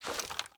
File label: lean in; ins2; weapons